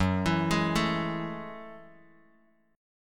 Gb Minor 9th, Bass Standard; arpeggiated; JGuitar

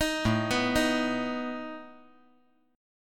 A Suspended 2nd Flat 5th A down

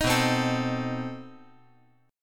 B 7th Flat 9th B, chord

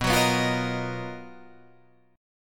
B Major Sharp 11th

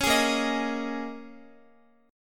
Bb Minor 11th Bb down Guitar; strummed; JGuitar

